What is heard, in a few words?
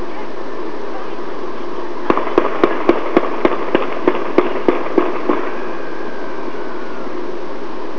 gunshot